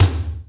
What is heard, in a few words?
Roland R-8 Low Tom